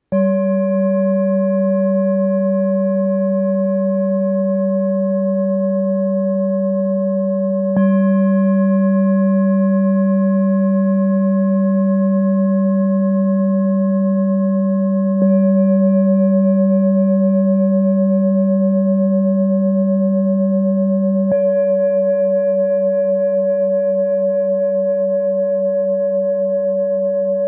Sol, cuencos tibetanos

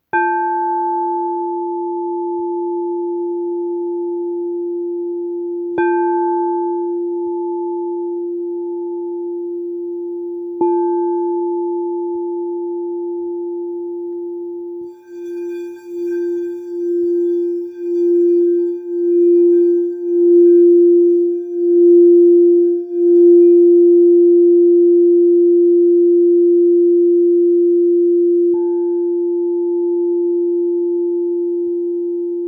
fa 174 346, cuencos tibetanos; Rojo

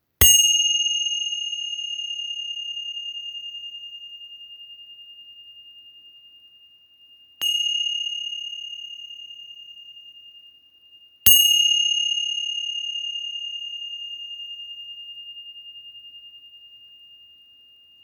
8 simbolos 6.5 fa, cuencos tibetanos

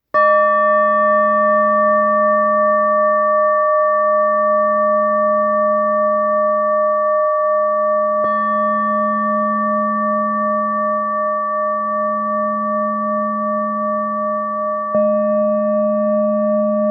Sol, cuencos tibetanos

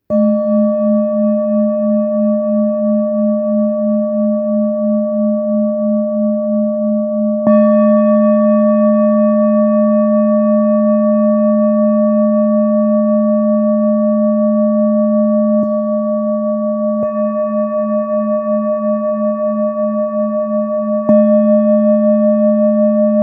Sol, cuencos tibetanos

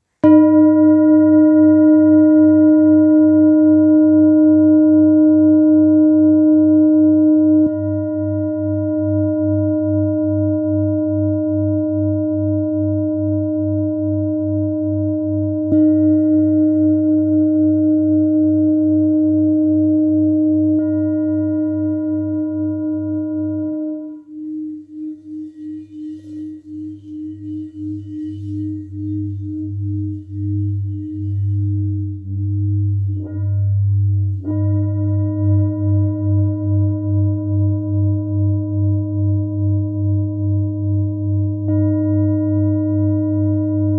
Sol, cuencos tibetanos

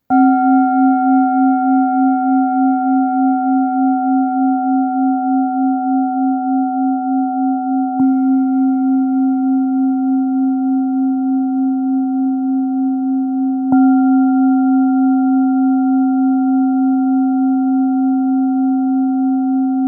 do 264 solfeggio, cuencos tibetanos

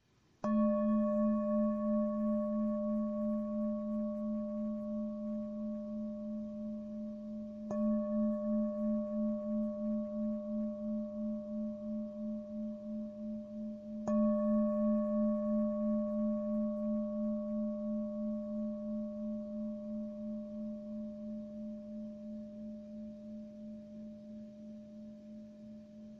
venus, cuencos tibetanos

